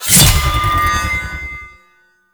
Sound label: Plunge Ability Cast; Enemies; Abilities; Warframe; Duviri; Dax Knight